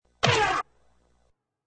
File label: A single punch to the body or face.; Punches and Hits; Combat